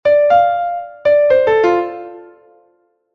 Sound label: Dm7 phrase